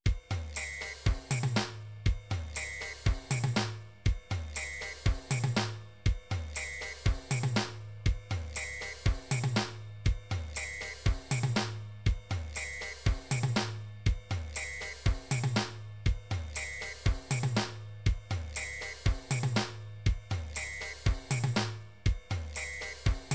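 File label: drums pattern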